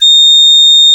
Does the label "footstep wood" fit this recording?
No